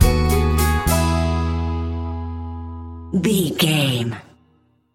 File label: small steps sting